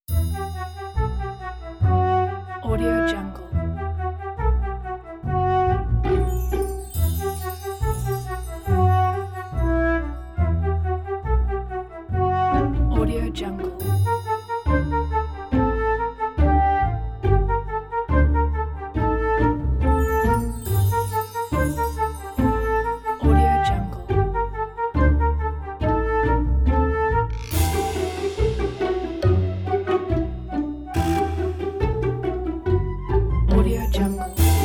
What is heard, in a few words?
quirky and funny